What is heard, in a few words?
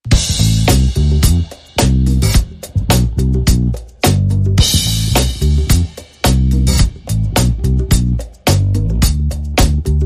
Drum & Bass Only